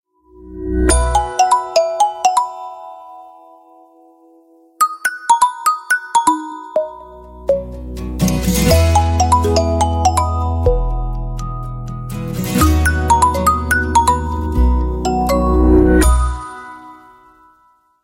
Microsoft Ringtone

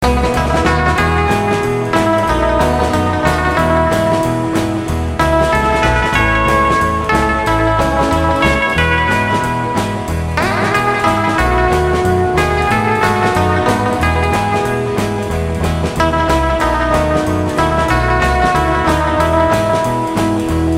Country

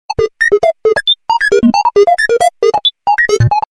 Sci Fi Sounds

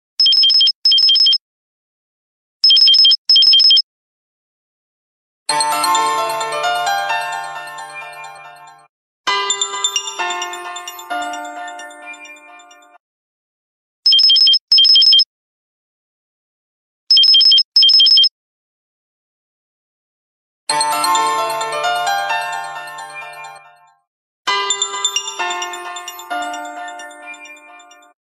Cell Ringtone

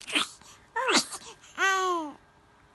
Baby sneeze

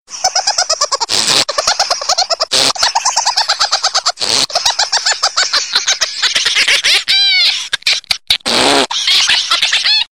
Amusing

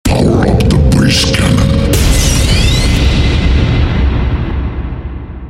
Tone

